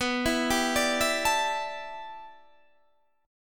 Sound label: G 6th Add 9th B, chord